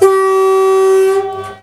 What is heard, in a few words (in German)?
hupe 218.1